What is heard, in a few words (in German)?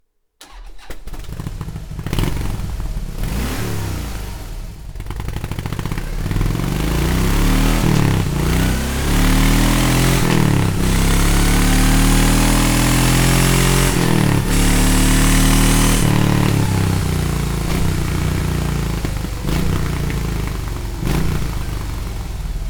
Softail original Anlage